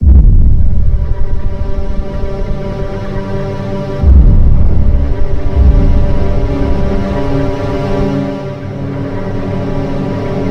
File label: tension; left4dead